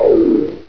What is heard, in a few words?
pyuon